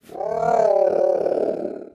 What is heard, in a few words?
mutant